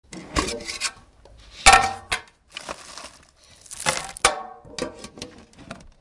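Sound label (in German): plätzchendose entleeren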